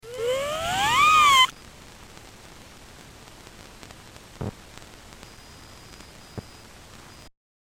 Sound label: vocal whistle